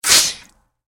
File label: Water Blasts From Fir, sprays & hoses